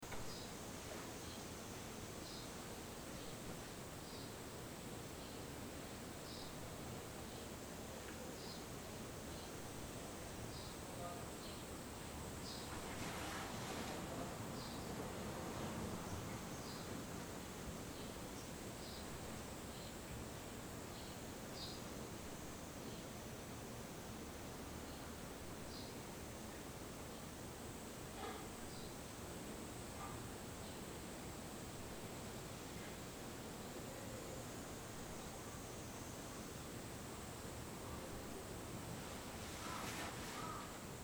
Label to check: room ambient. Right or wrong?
Right